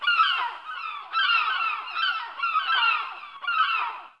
gull; Mafie 1

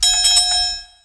tram; Mafie 1

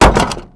wood; Mafie 1

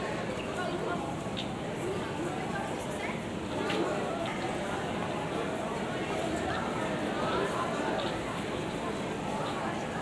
people; Mafie 1

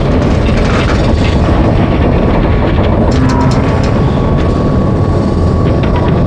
bridge up; Mafie 1

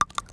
sand; Mafie 1

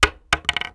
wood; Mafie 1

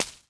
grass; Mafie 1

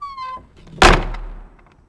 wood; Mafie 1

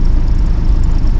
arrow; Mafie 1

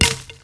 bush; Mafie 1

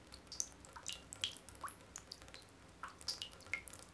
drop; Mafie 1

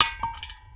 metal; Mafie 1

